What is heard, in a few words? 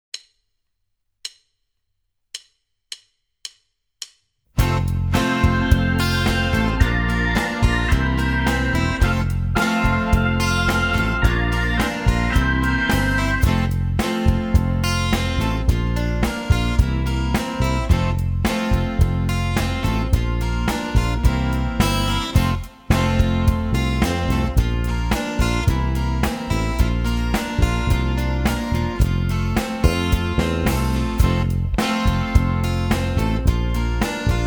Easy Beat, Saxofon